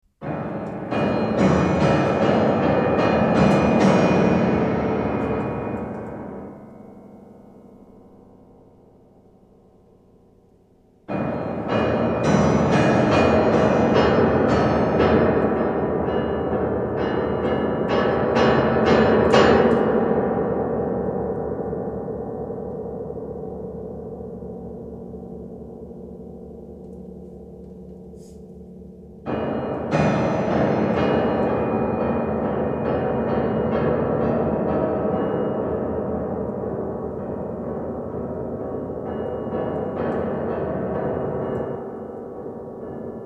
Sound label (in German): Klavierstueck; solostuecke